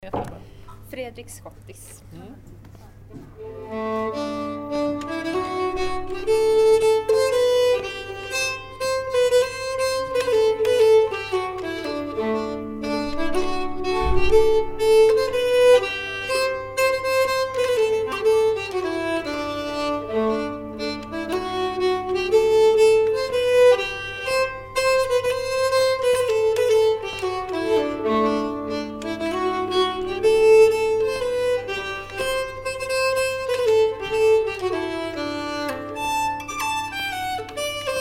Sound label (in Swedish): schottis